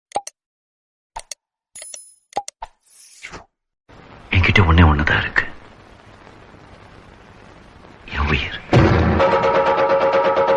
Birthday Tamil Birthday Song

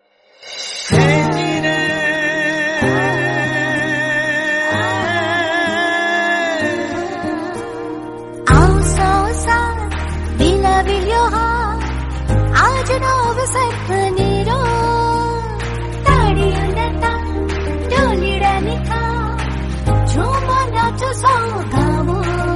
Happy Birthday Papa Song Gujarati cover gujarati

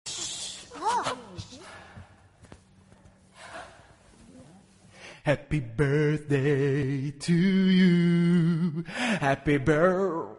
Birthday Wishes Funny

